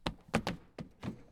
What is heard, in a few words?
Stomp Wave